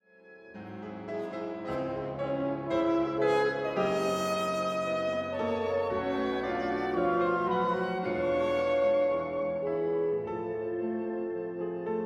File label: piano wind; poulenc